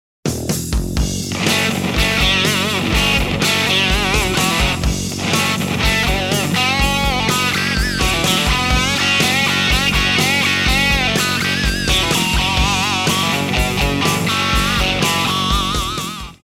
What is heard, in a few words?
Fender Twin Jensen